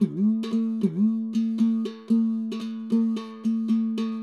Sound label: Indian Ektara A, One String; World